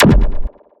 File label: Bzoop